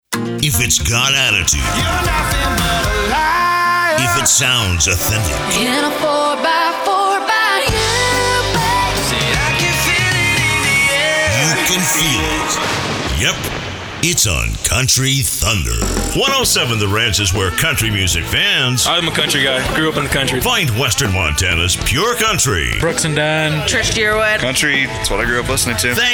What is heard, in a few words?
Country